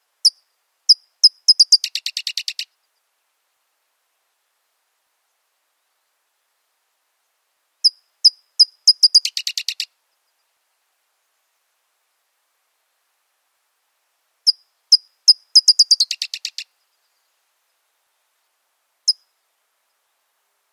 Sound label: California Towhee